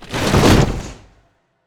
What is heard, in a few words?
shield Wall Falling; netent